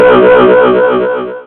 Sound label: error